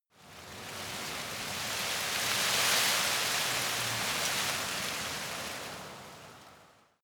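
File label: tree leaves rustle soft short, garten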